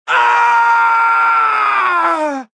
Scream Male, Male scream, pain, agony, (human voice); Screaming